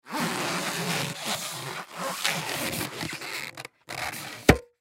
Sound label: Balloon Tie Blown Up Balloon; Cartoons; Foley; Blastwave